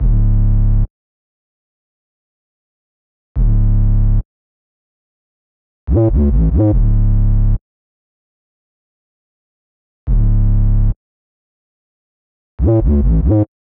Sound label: 808, 143 BPM C# Min; Cymatics; Balaclava